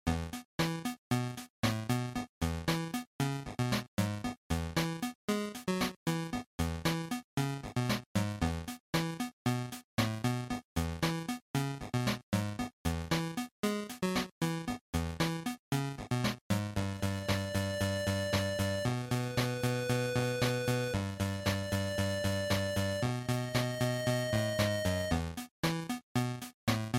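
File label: Game Boy Fighting Story, bgm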